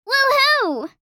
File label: Teenage girl cheers and support; misc human